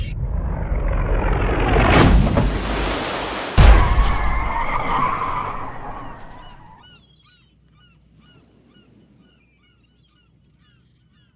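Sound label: ambience seaworld out; Blind Drive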